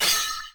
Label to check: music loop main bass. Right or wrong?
Wrong